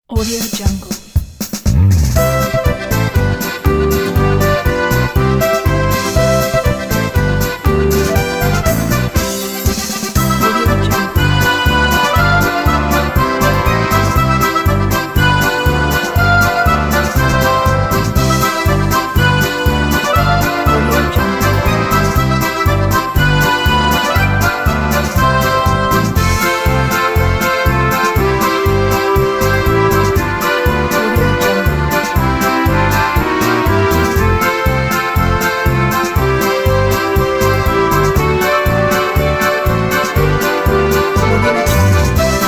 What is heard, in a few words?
german polka; bequadro